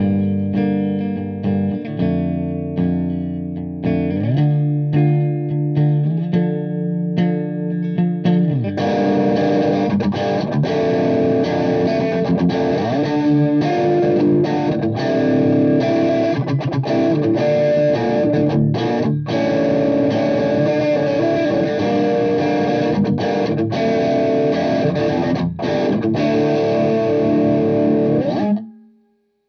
Verzerrer Pedale High Gain; Soul Food

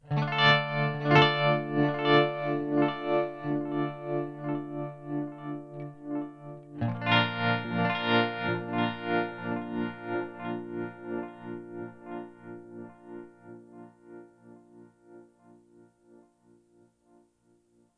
Delay mit Tremolo; TC Electronic 2290 P

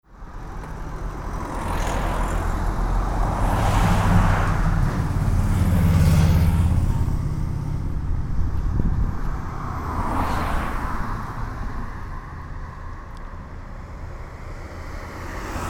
Strasse Autos Vorbeifahrt Atmo; R-07; zuerst Gerät nach Vorn dann nach oben gerichtet

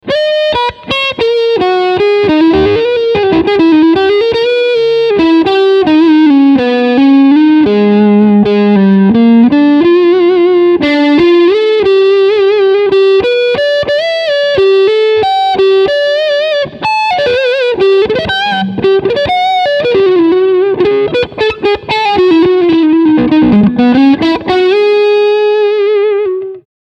Overdrive beide Humbucker

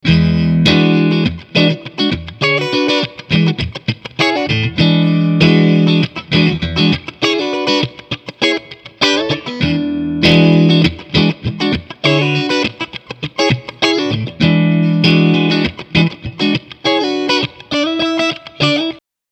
Beide SC Clean ohne Booster

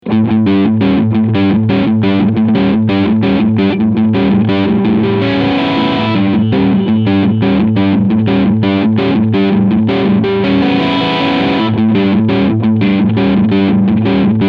Gibson Les Paul 68 Custom Reissue Crunchsound beide Pickups

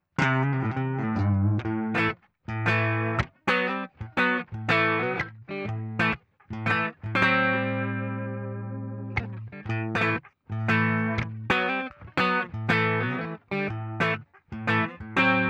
Soundvergleich Neck Pickup Cort Links Ibanez Rechts

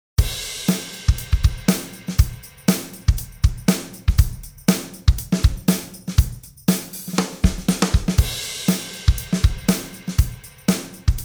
Drumbeat Oxide Input hoch Output runter

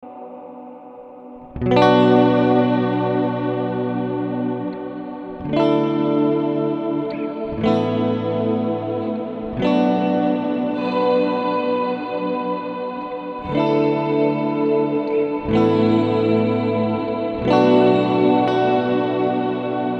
Immerse MKII Hall

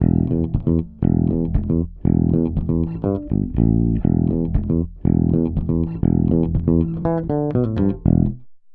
Tubesessor Bass Kompression 80 Hz SC Filter Mild Saturation; Heritage Audio

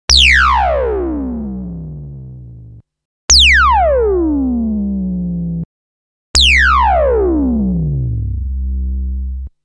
vergleich Dreieck Reso

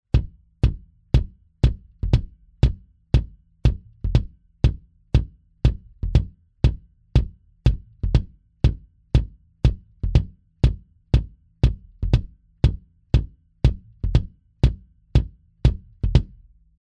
V Kick Schlagfell Classic; sE Electronics

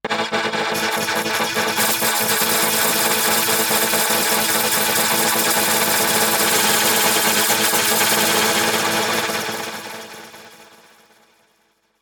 Emu EIV classic und Ultra Granular synthese Hp Filter

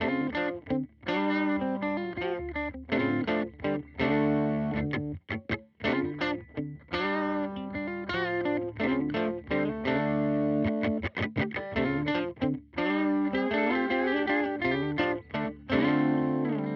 Fender Bassman Kompressor; HX Stomp

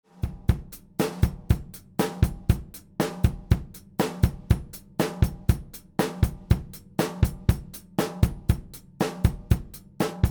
Drums, 4 Mikros

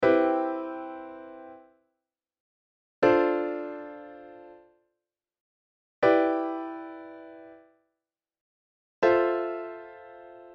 Vierklänge

